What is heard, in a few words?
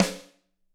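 snare drum; Bob Clearmountain Drums I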